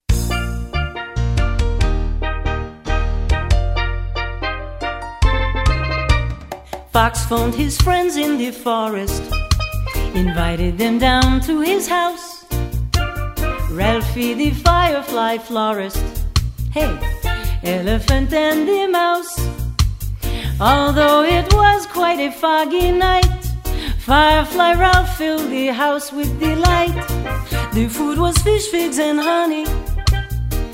fun fun vocal

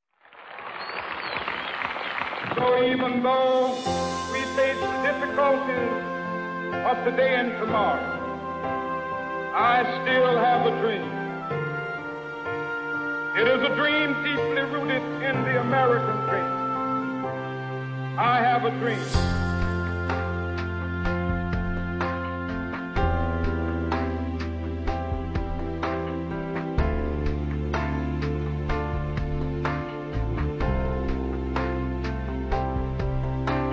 Instrumental; martin luther king

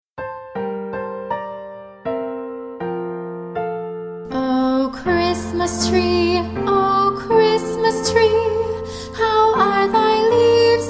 o christmas tree vocal, Children's Music; o christmas tree song

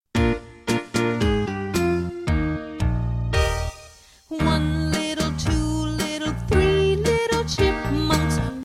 ten little chipmunks fast; folk

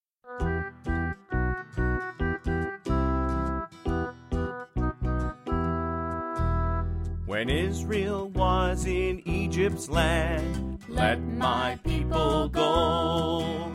go down moses vocal

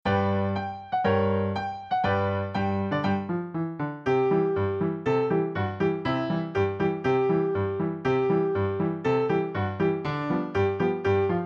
head shoulders knees and toes instr

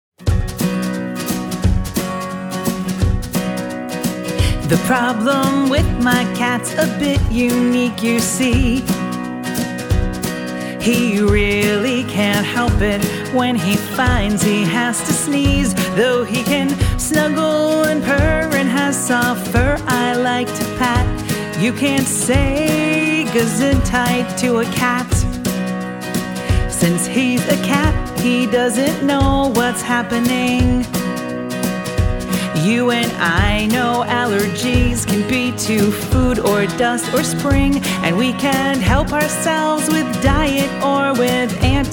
meow meow achoo

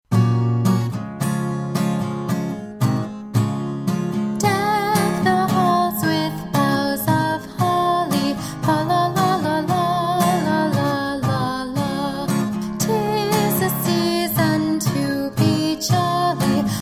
vocal; Deck the Halls

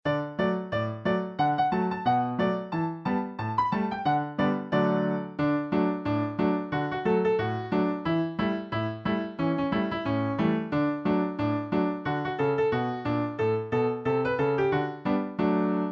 paw paw patch instr; folk

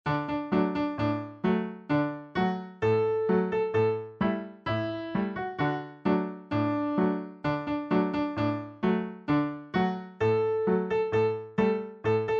un petit canard au bord de l eau instr

